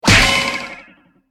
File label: Skill Hit Melee Smash; Angry Birds Epic